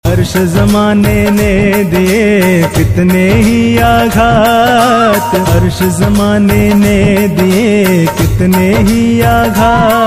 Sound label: Khatu Shyam Bhajan